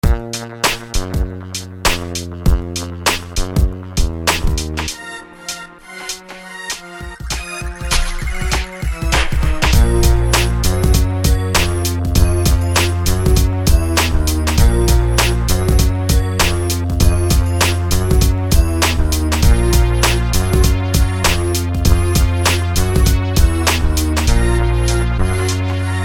Instrumental

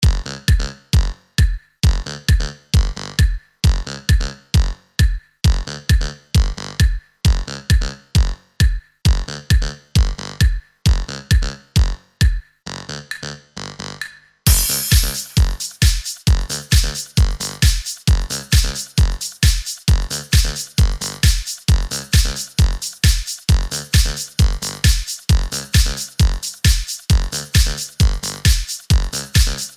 Electro Beatz; Clubbeatz

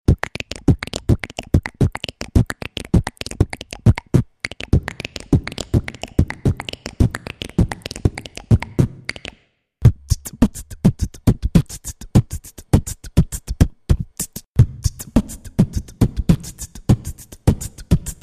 BeatBox

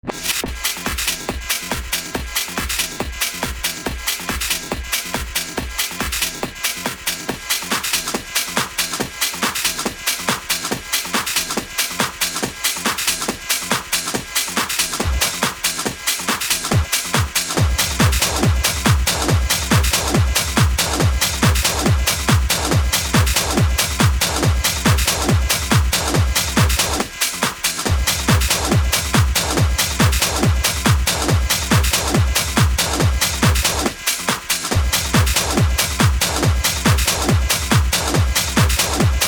night with noise hard bass version

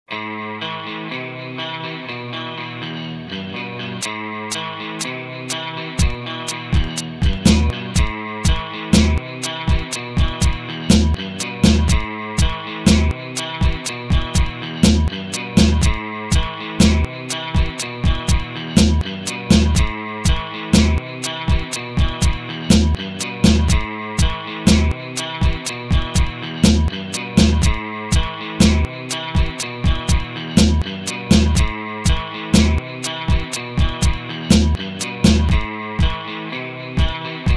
Guitar skit

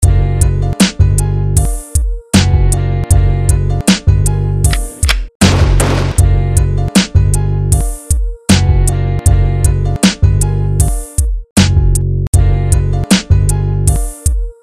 Instrumental; Wilku

